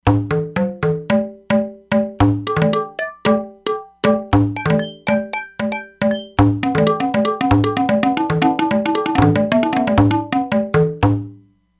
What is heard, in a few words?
Tabla Tarang; Factory patches